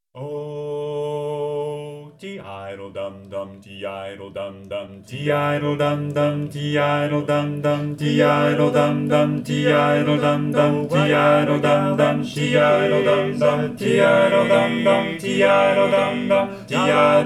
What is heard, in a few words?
baritone predom; 2024 spring show